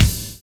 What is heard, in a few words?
BIG ROOM; Univers Sons - Kitch 70s